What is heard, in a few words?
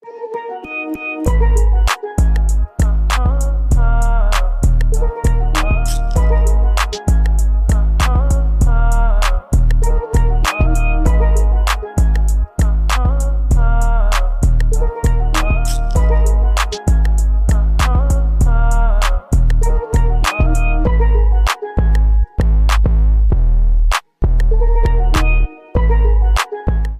Killer Ringtone